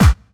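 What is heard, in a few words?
snare drum bass drum, Dance Mega Drums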